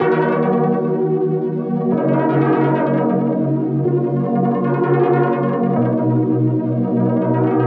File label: Synth Loop 125 bpm CMaj; Unit Rcrds